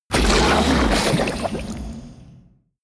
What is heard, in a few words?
kraken growl; kreaturen